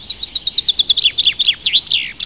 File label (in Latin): Fringilla coelebs